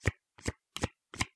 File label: cards deal; keezers